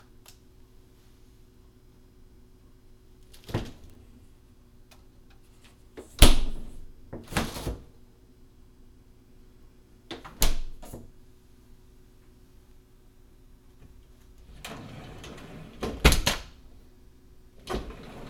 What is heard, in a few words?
Things that open, close and roll, binaural